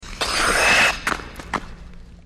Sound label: Ice Scrape Ice Skate, ice skating